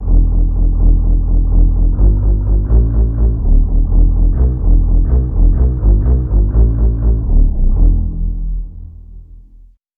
pizzicato section; bass

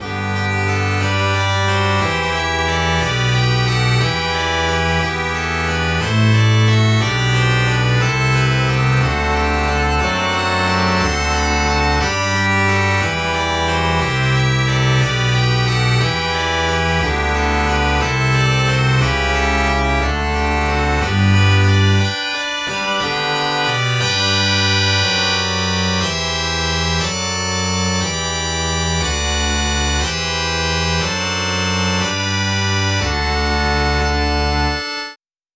contrabass

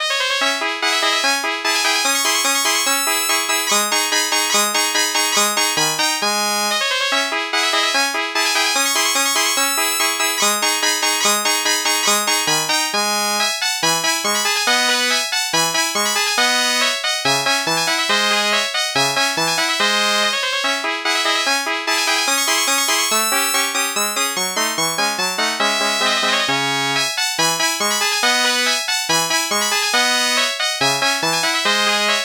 trumpet

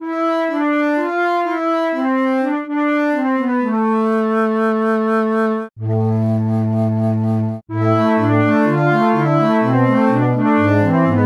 flute

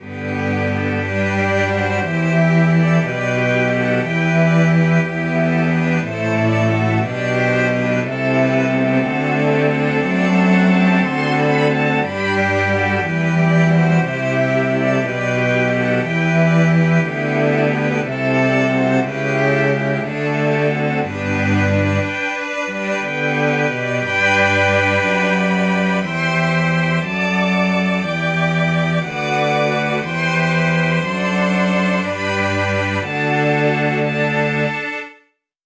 cello

